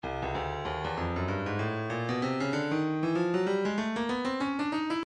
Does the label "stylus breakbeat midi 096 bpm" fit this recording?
Yes